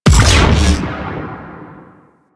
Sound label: Beam Fire; Enemies; Abilities; Warframe; Corpus; Arachnoid Camper Terra